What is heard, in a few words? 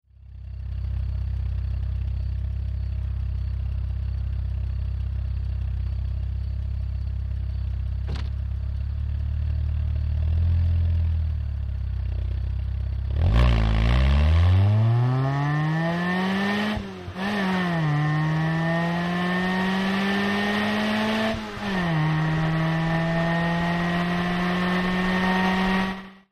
gti 16v supersprint; mods